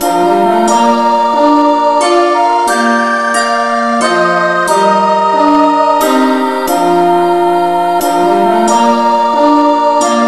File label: mcintosh rev no vocal key bes